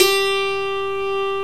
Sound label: DULCIMR KOTO; OMI - Universe of Sounds